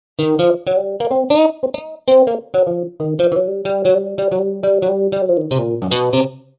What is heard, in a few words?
funky; TURBO VL